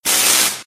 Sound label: Electricity; doodle jump 2; android